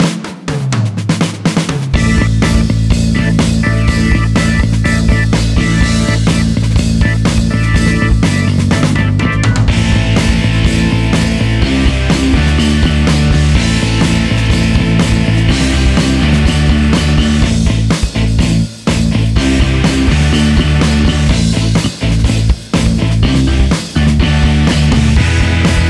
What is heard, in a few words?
bass